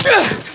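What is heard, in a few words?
grenade throw; weapons; dod 1.3; dod enhancement pack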